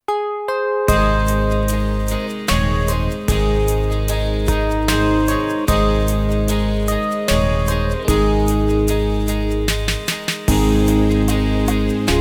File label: Clarinet to play along; Kumbaya